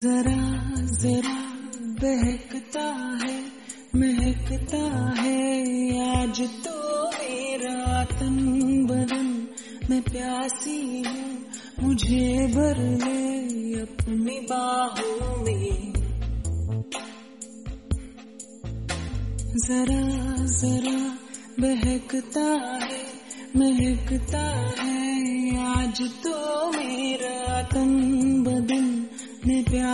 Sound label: Slow And Reverb; RHTDM